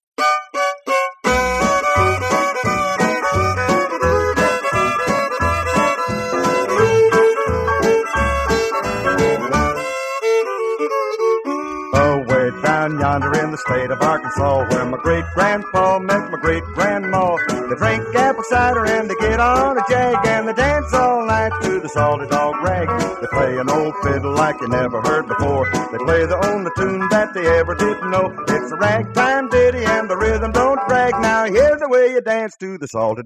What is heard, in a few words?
Old Country Music